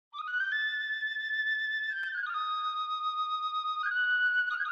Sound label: Flute Track - Intro; Fossil Echo; The Bazaar